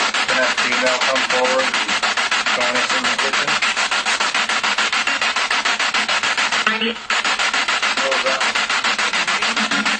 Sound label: Kitchen Spirit Box